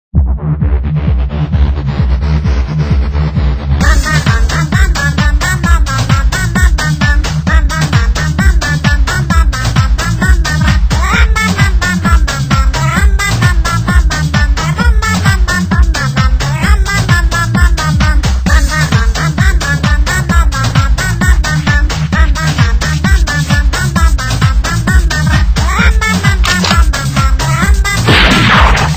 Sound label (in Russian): polka